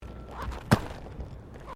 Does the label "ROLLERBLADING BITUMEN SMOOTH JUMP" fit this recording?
Yes